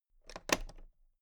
toolbox latch open soft

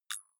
click rough, signale

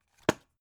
drug package drop deep hard; arzt

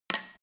small drop indoors; handwerker; berufe

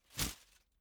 whoosh paper scrap flutter; swooshes-swishes

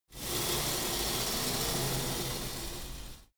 pullup machine cable release; sport freizeit; fitnessstudio

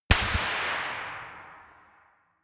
gun slapping pop gun ii; schusswaffen

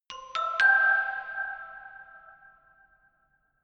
notification glockenspiel creepy triad up major

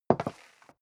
step leather shoes wood parquet scuff; schritte

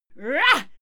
female fight attack hit

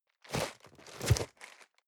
cardboard box medium turn hard; objekte

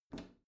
bathroom cabinet open soft; objekte; tueren

